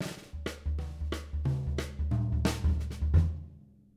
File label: SWING, Living Drums; Sampleheads; Peter Erskine Living Drums